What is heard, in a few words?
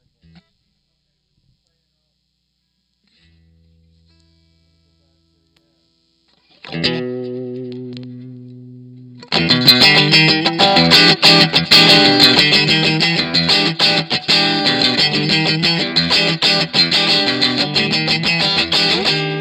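ODS Strat Clean Channel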